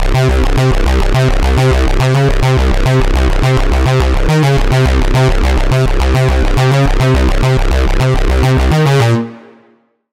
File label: Lead; Spoiler; Routed Sound; Vital Expansion - Doom Carpenter Vol.1